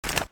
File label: mg42 clip in